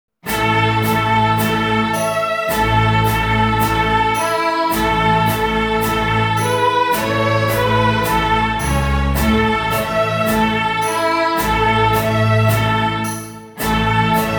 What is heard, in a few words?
Full Ensemble